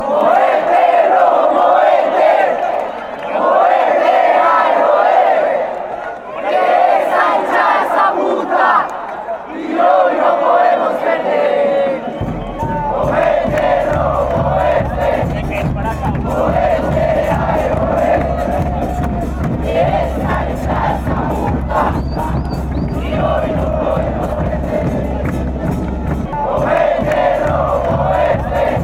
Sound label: chant; independiente medellin